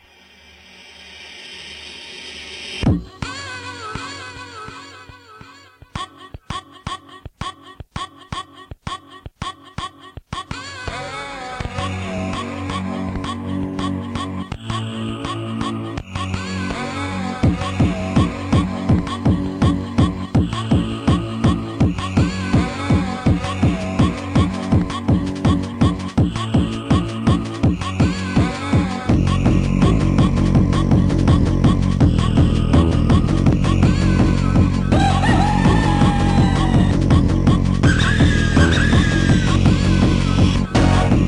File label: Dissonant sampled Techno